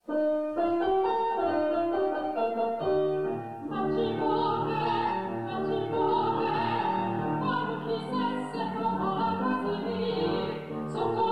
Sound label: japanese childrens song